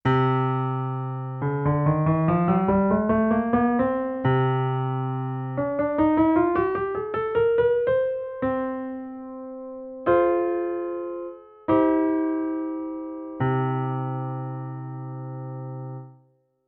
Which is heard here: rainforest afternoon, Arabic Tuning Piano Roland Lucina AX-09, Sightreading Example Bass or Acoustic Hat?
Arabic Tuning Piano Roland Lucina AX-09